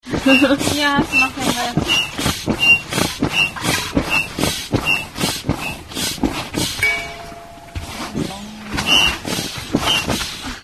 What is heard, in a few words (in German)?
pumpe; schweden 2000